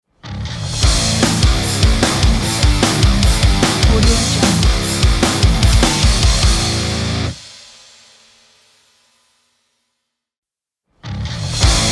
short metal logo

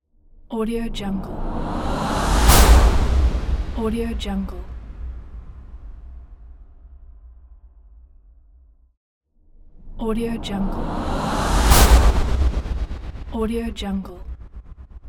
epic choir cinematic whoosh impact

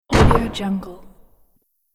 world builder wood hit

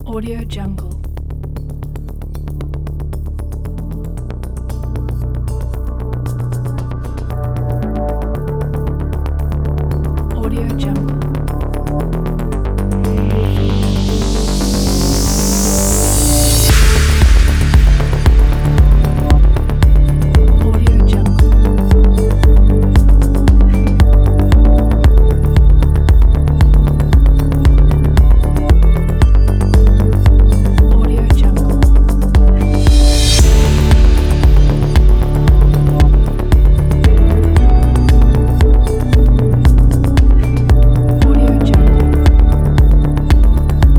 engineering kit

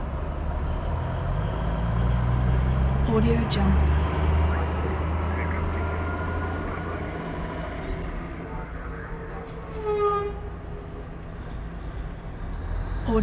accident scene

